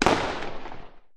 explosion smoke far; cannons; War Thunder

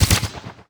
carbine charge up

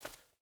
footstep burlap concrete walk; Rust

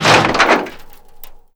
mtl sheet

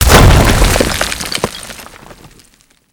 Projectile Sounds; Red Orchestra 41-45

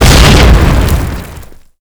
grenade explosion; Explosions; Call of Duty Modern Warfare

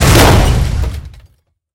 tank impact tank; tanks; War Thunder

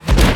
depth bomb; World Of Warships; weapons

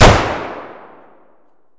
rem870 fire indoor distant; Escape from Tarkov

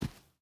footstep boots sand walk; Rust

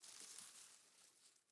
leaf rustle grassy soft

